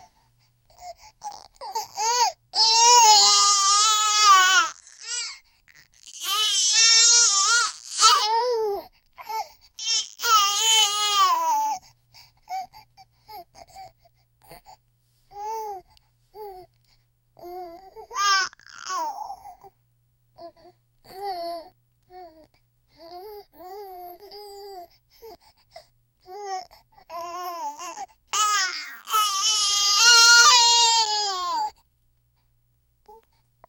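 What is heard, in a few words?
infant baby muffled crying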